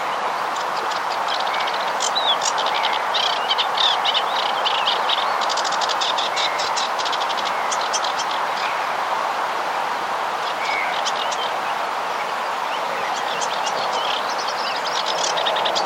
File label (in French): phragmite joncs étang